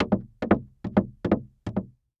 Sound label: Foley Footstep High Heels Fast Walking On Wood Platform Short; Foley Footsteps